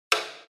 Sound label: fx dong